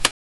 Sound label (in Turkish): slot oyununda semboller, slot machine